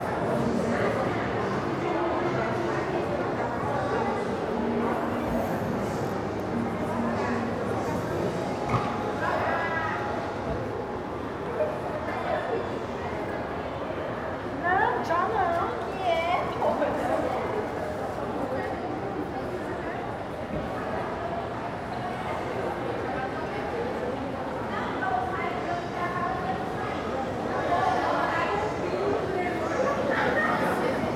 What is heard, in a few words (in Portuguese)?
Ambiencia shopping piso inferior muitas pessoas vozes femininas; musica; araguaia